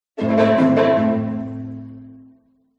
MUSICAL ORCHESTRA Jingle Strings Pizzicato Hectical; smartsound